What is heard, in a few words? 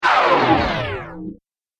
Alien Shut Down; Alien Sound Effects; Andrew Fleming